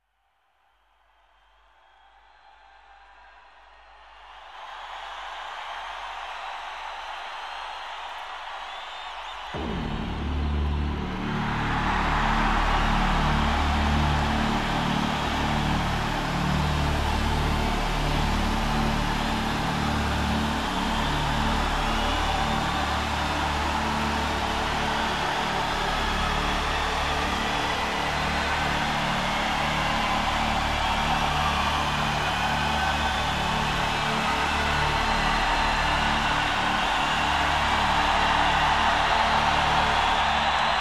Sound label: Instrumental